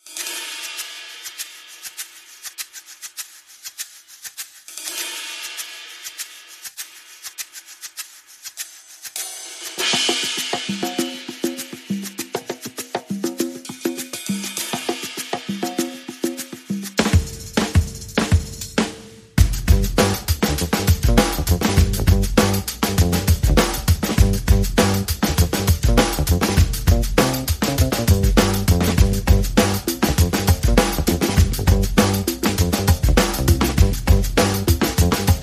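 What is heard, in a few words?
Bass and Percussion Only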